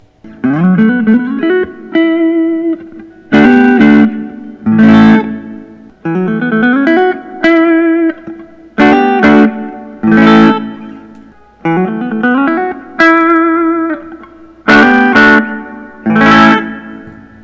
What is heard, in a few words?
paul reed smith modern eagle i trem faded